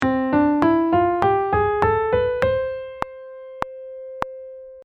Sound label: bebop maj